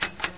button; mistake coop

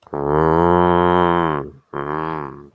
cow idle; svencoop; cow tycoon; gonome replace

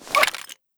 draw, wpn; svencoop; arctic incident; ins2

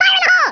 fire, grunt; sc toysoldiers; fgrunt

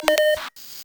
ifak pickup; svencoop; frostline

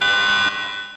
alarm; svencoop; lostmaze

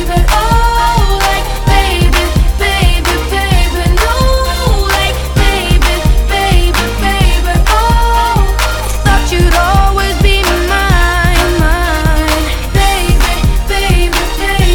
egon run, weapons; svencoop; cracklife

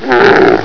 die; svencoop; classic; imp

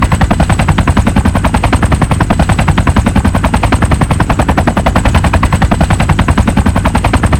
rotors; svencoop

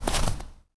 pl dirt, player; svencoop; arctic incident

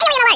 alert; svencoop; sc toysoldiers; hgrunt

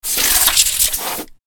hiss, queen; svencoop; legends

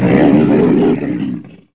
die; svencoop; legends

